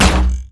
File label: basicattack; LoL; twitch